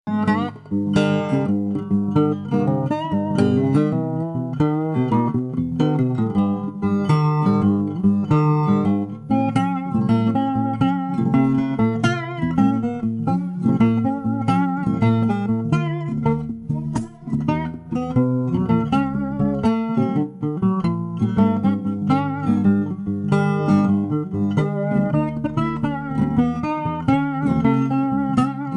blues, solo acoustic Mississippi Delta blues; suno

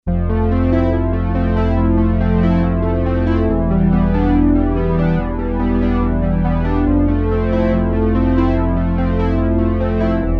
Juno106 original; boss

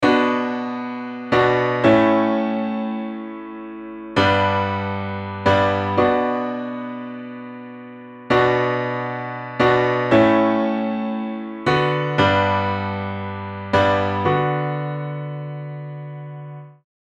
piano light saturation; waves J37

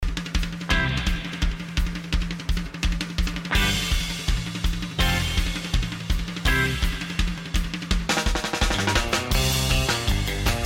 rock, surf rock with organ and claps

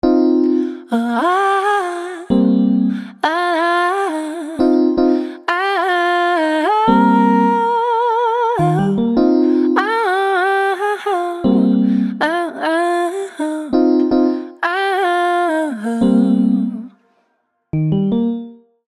dry chords vocal; waves

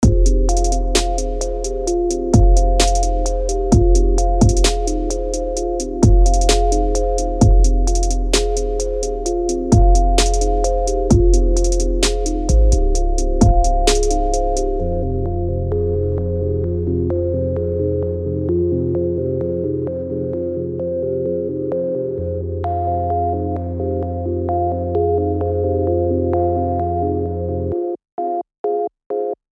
instrumental; Waves; music theory for vocal tuners harmonizers example

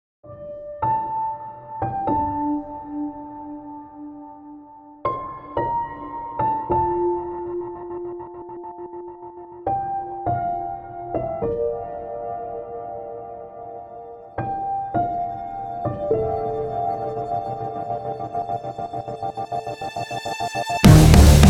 Reverb Blues; UFX; ujam

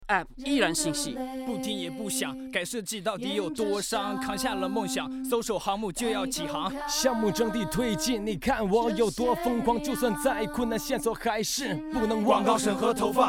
chorus

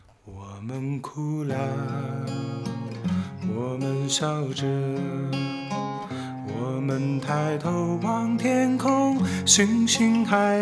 guitar middle distance

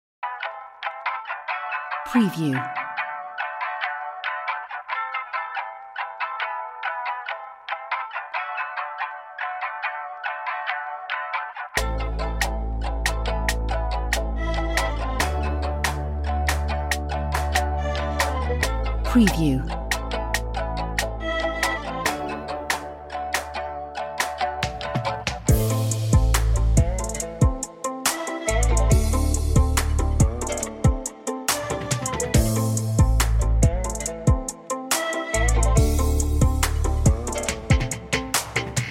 trap; Trap beat in country

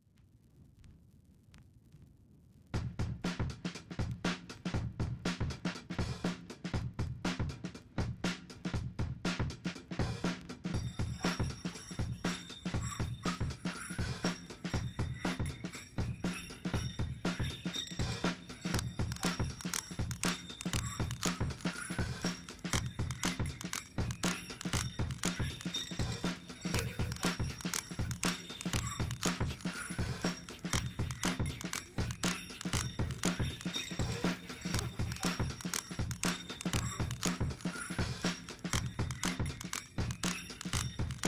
Breakbeat; Cabin Fever

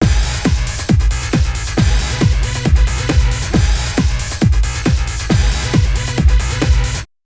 MIX LOOP, comp, filter 90 hz; shadowhills; 4to1

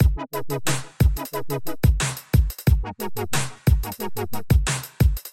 Unison

